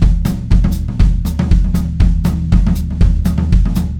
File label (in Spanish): Loops mutantes